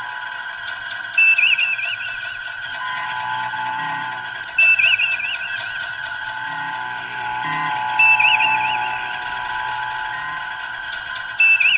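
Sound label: ambience bridge; valve; grimtrek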